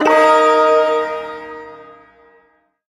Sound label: Ersatz Shawzin Chromatic Chord G; Music; Chords; Warframe; Duviri